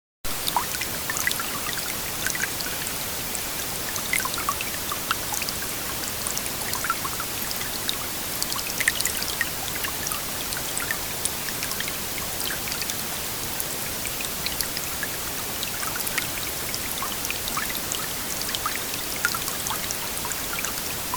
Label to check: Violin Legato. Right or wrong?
Wrong